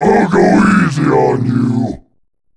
power up; db mod; omega

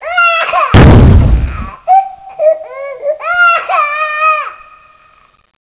death; CSO LightZombie

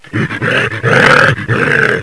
pain; cstrike; zombie plague

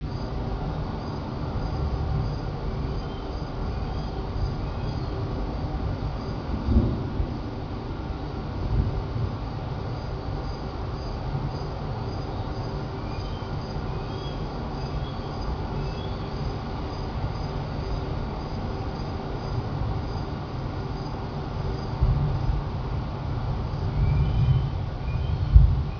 ambi; cstrike

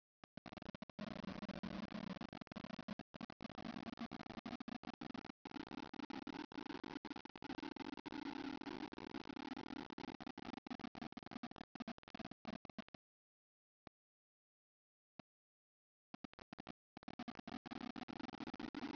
ghost; cstrike

